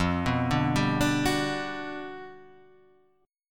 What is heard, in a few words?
G Major 7th, F; arpeggiated; JGuitar